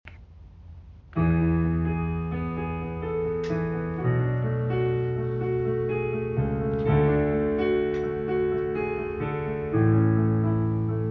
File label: Bass